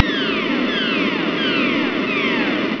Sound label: meteo